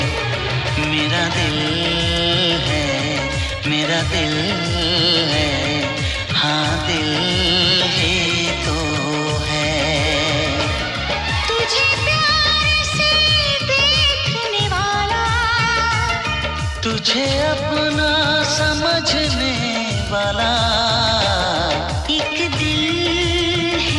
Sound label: Song Ringtone